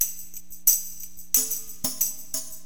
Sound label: TAMB, Perc